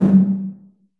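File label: large room 808, reverb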